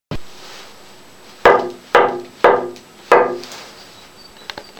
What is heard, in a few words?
spruce, plates